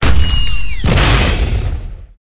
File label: attack boss; catapult